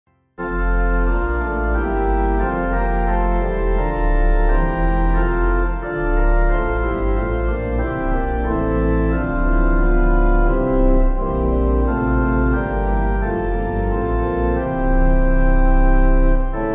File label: The Duteous Day, Innsbruck, pipe